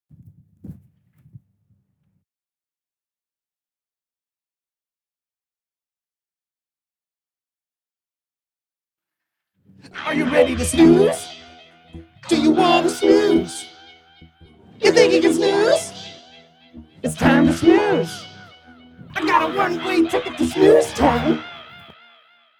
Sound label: Vocals